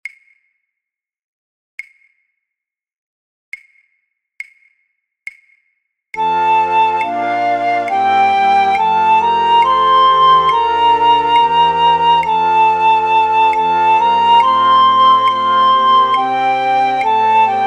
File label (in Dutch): Matthaus Passion fluitorkest Piccolo; voor oefentracks